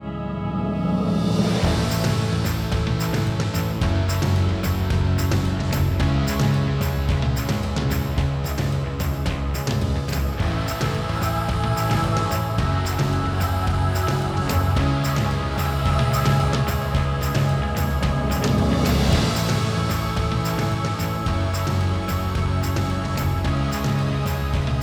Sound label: Music; The Trumpet Awards 2014